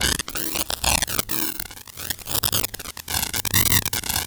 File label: STATIC, INTERFERENCE; Spectrasonic Distorted Reality 1